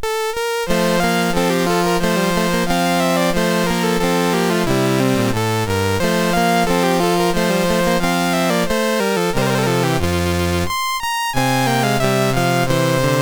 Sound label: gavotte in F, mus MOS6581R3